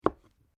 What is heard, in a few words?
MUSHROOM CUT; Various food preparation; Sound Effects:Restaurants; Foundation3